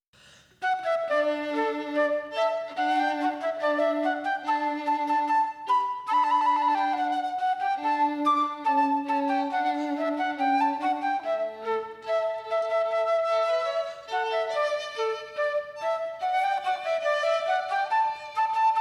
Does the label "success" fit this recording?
No